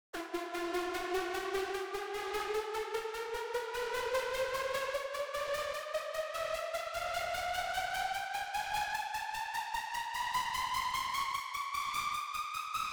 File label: 150 BPM F# Min Riser, Future Bass; Cymatics - GHOST (Beta Pack); Believer